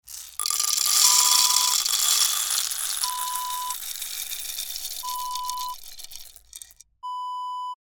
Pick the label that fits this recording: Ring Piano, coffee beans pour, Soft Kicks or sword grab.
coffee beans pour